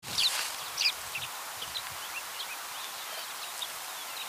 Calandro

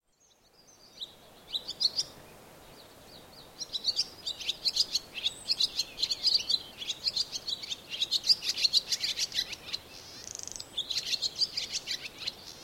Rondine Comune

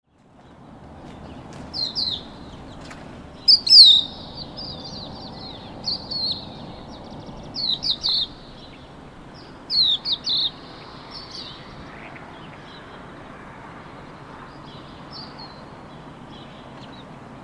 Cappellaccia

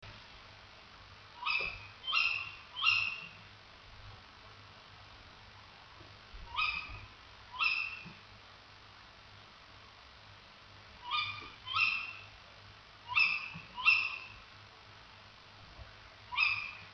Allocco

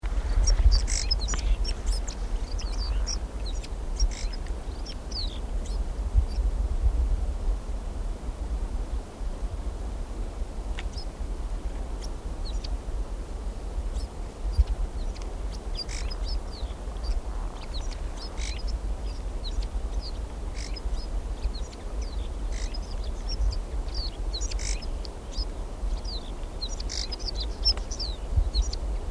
Fringuello Alpino

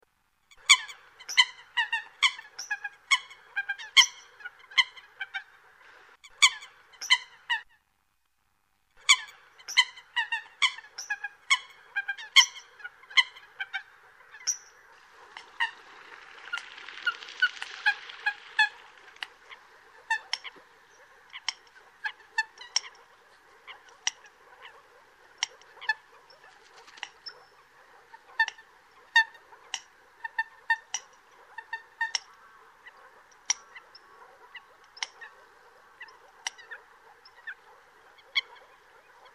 Folaga

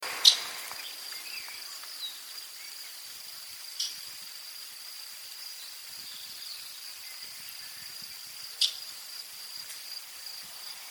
Rondine Montana

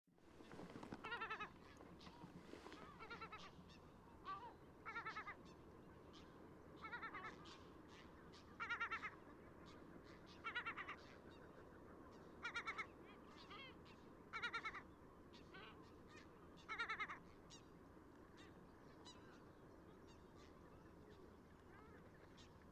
Zafferano

